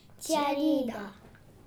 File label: cheerleader